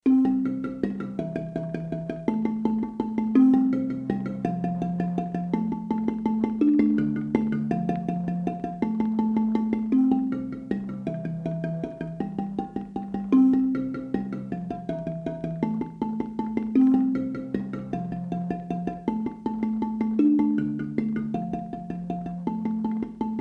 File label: bari, marimba